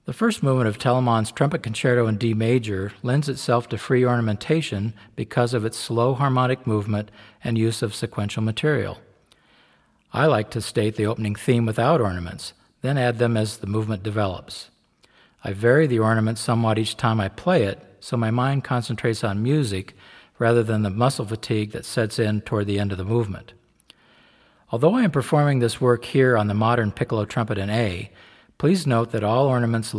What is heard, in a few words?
Concerto in D Major, Baroque Trumpet; 'di Melante'